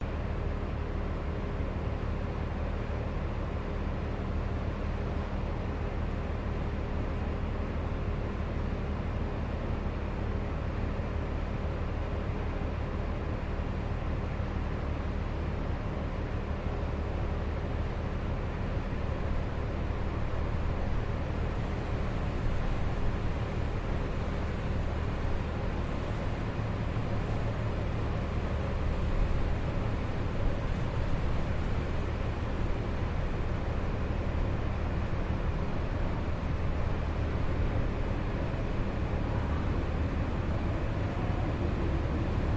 Boat Engine Boatride On Donau Driving Under A Bridge; Budapest Hungary